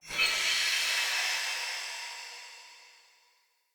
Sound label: Great Sword Recall Button Push, Weapons; Player; Swords; Mendicant Great Sword